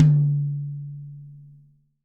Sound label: DRY KIT, Drums; Real Mega Drums